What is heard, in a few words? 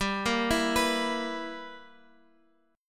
B Major 7th Suspended 4th Sharp 5th G